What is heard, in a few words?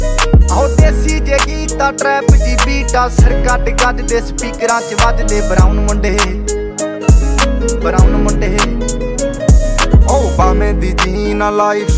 Trap Jehi Beat Aa Ringtone; ringtones